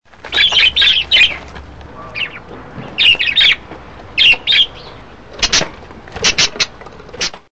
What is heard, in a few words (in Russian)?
golos popugaja